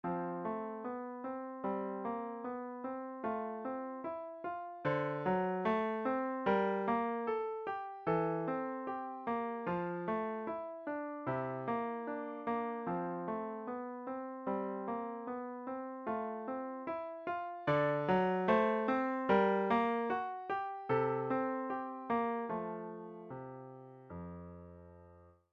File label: Melody in F, beginner piano music